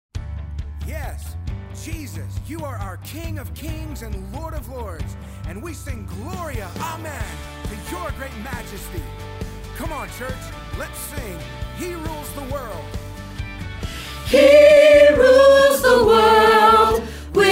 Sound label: gloria amen reprise soprano rehearsal track